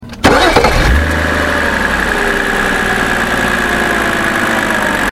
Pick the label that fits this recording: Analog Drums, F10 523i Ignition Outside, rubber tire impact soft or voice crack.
F10 523i Ignition Outside